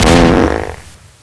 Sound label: mega boost